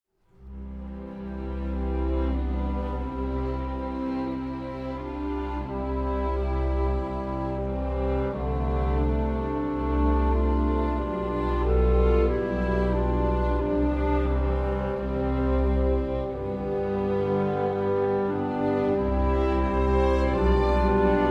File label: piano